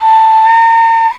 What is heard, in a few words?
Flute Raw; 音MAD